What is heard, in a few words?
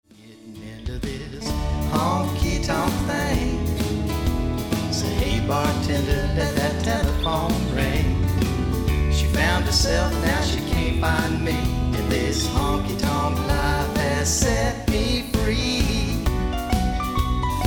studio mix; mixing